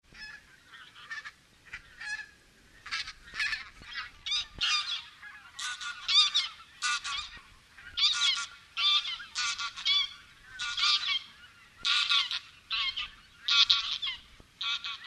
Tundrasadgas

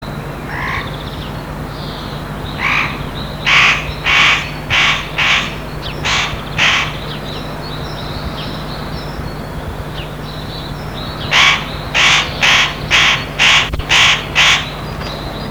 Notkraka smalnabbad

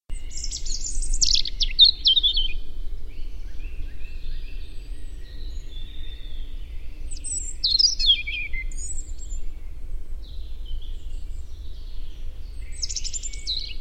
Rodhake

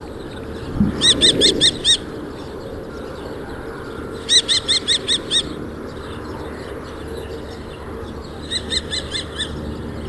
tornfalk

